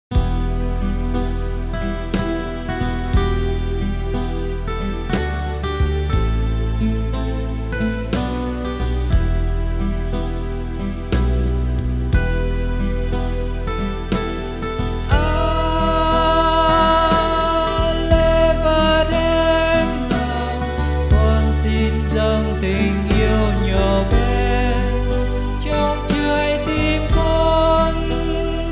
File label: BASS